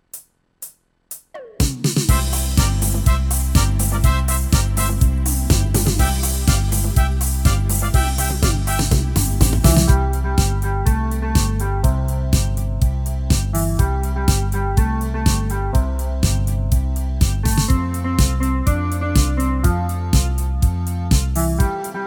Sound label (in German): schlager party